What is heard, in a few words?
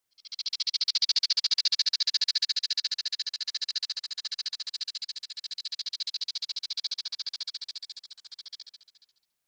Chopper Heli Drone